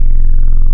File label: Triangle Res, wavetables; Animoog